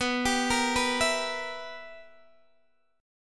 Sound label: B Major 7th Suspended 4th Sharp 5th Guitar DADGAD, chord